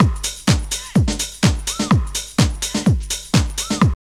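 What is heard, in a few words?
drum loop; X-Static Goldmine 3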